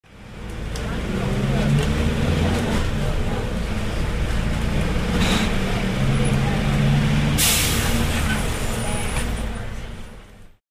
transportes linea persona tocando timbre descenso

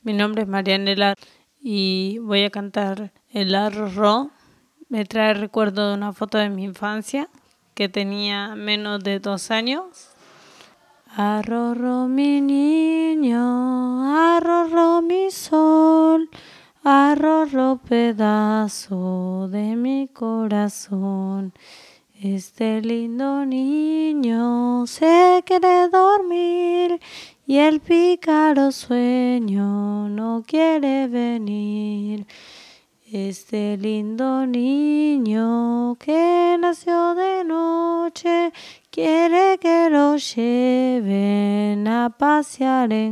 canciones de cuna

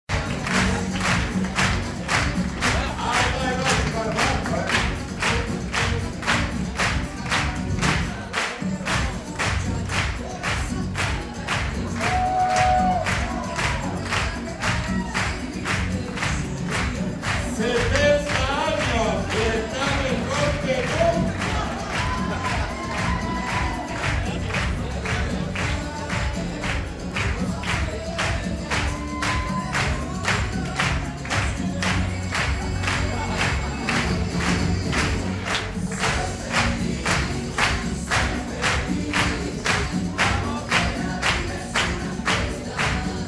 acto dia del orgullo; glttb; sala lavarden; sonidosderosario

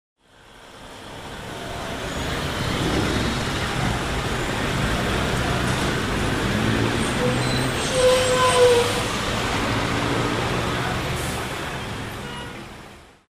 calles av san martin y av uriburu; Rosario

